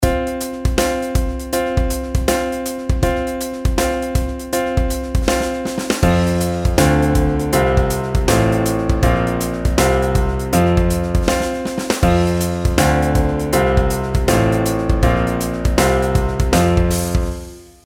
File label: pitch patterns skipwise